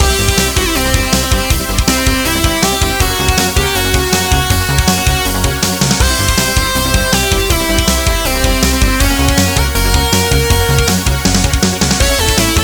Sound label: space level loop, zombie soundtrack